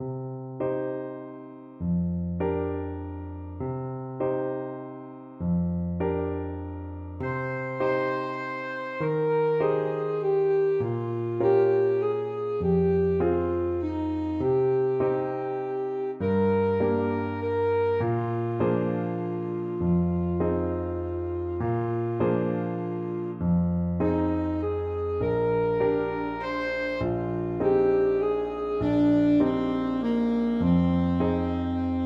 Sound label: saxophone